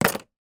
industrial tools screwdriver put in tool box; sound effects one

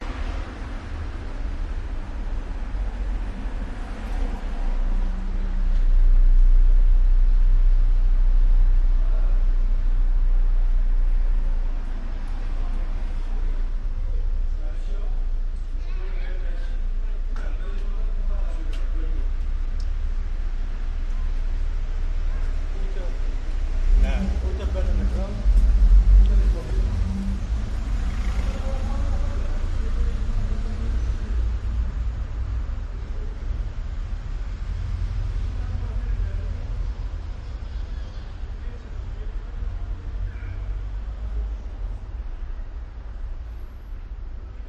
athens side streets weekday evening; dimitrakopoulou; your free sounds

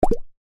bubble pop

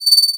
multimedia rollover; sound effects one

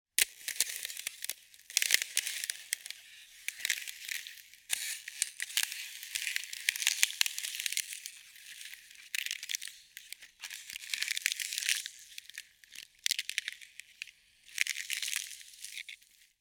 nature thin ice crack under pressure

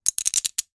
rummy o bricks stack

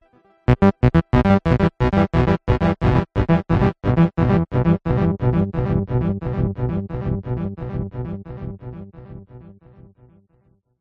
synth melody sci fi space sting lower; Jessey Drake

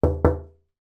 household window glass single pane knock x2; sound effects one

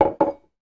household mug coffee down on table; zapsplat

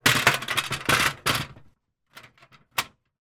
industrial step ladder open up

